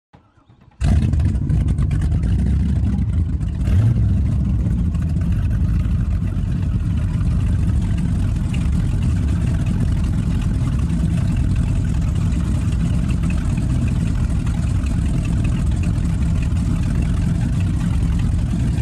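Power Boat Interior Start Idle, off shore power boat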